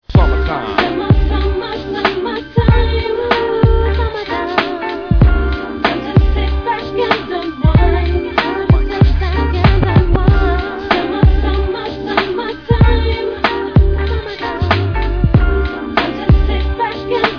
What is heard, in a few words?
jazz